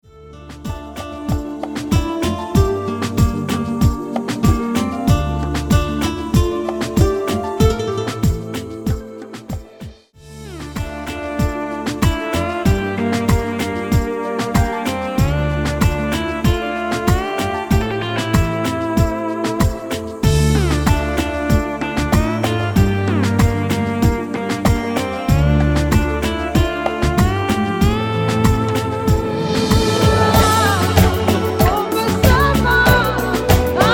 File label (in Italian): Canto